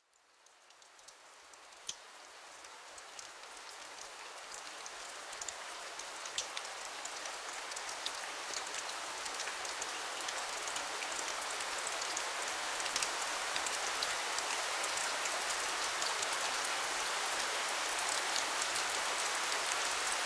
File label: rain; daynight